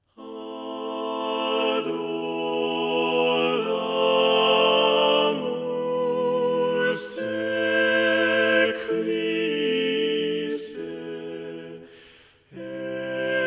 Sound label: Adoramus te Balanced Voices, choir tracks; Palestrina; Strengthen for Service